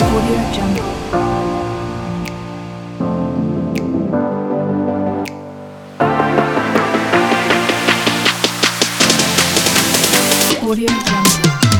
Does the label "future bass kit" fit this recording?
Yes